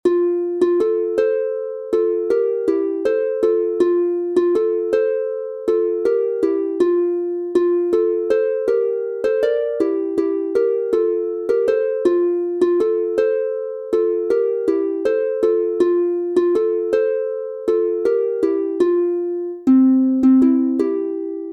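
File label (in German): F Dur 2 stimmig instrumental, Harfe; Volksmusik aus Bayern; Schauts mir´n nea an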